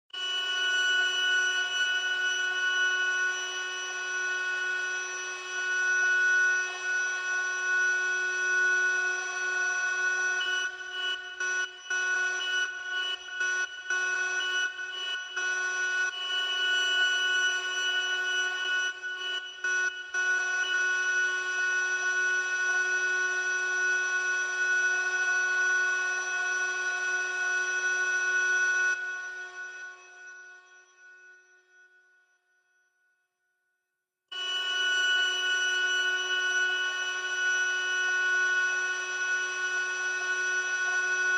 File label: Alarm Only